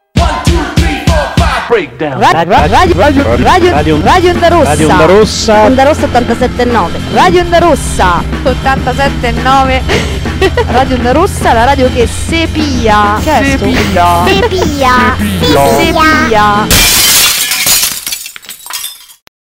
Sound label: Jingle